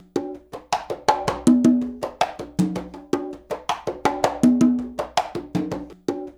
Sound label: percussion loop